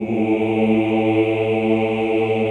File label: CHO A1, Ooh; Ooh + Ooh 2